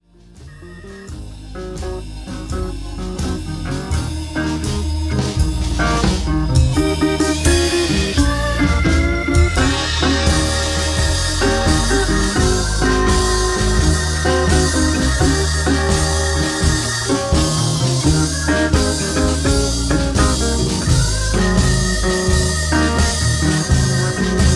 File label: Delta Blues in Eb